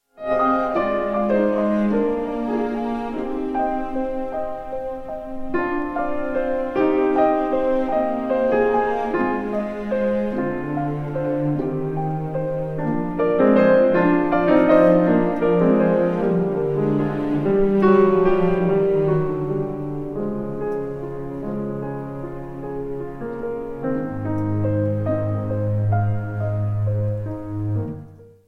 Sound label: piano concerto no 2 in F major, orchestra; ulster orchestra; dmitri shostakovich; op 102